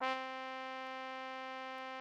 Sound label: Instrument